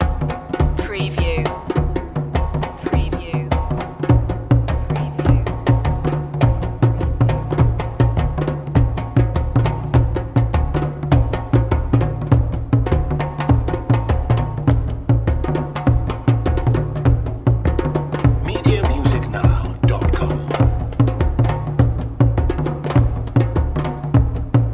african drums ikibwenga nyakyusa fusion loop

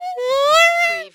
Cartoon Clarinet Squeaky

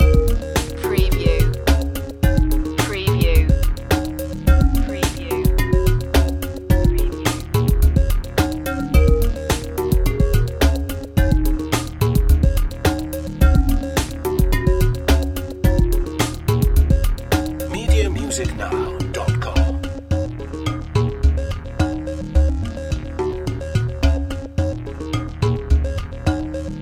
beat loop

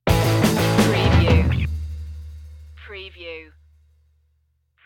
punk pop stinger

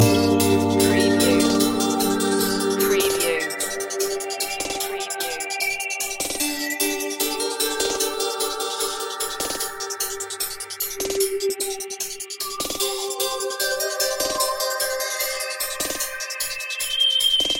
xpress loop

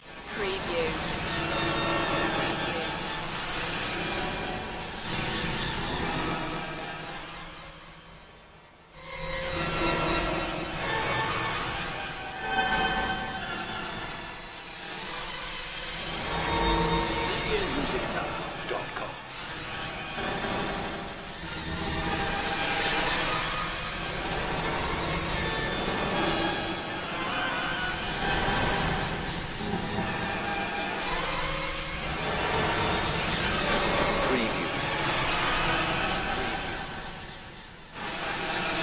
dissonant glitches ambience loop

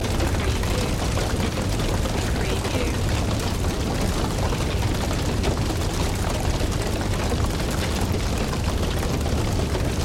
Fire Wood Crackle Break Loop

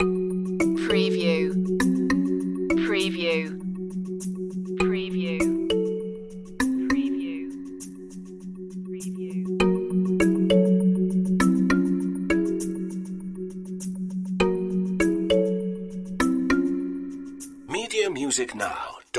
kalinka loop

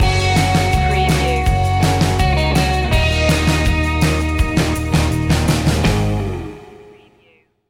breakin waves stinger

